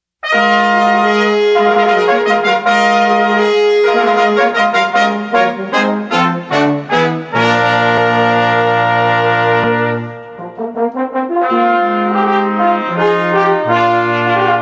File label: fanfare